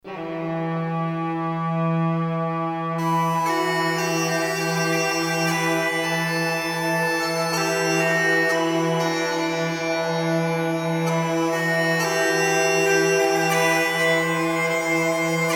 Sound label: Siren Dirge; Nancy Drew Music for Mysteries Volume 2 2008; DDI